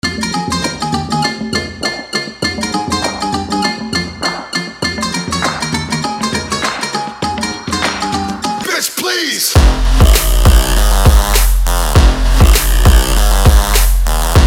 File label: banjo